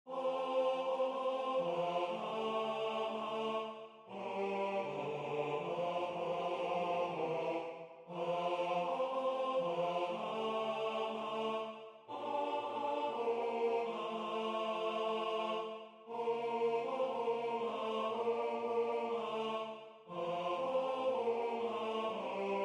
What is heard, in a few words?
tenor; In The Bleak Midwinter; Waiheke Island Choral Society